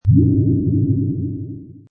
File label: Process, Sci-fi. Computer or mainframe processing; displaying data. Also forcefield or opening/closing sci-fi door; Lynne Music